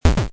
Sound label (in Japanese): dosun